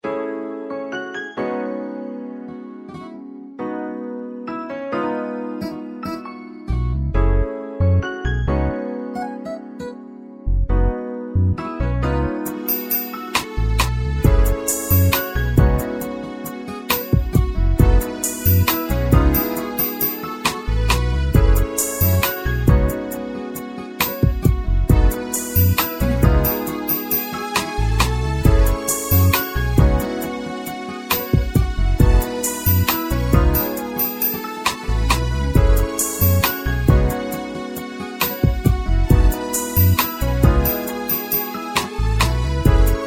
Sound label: seeing voices instrumental